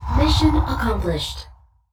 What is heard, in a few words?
win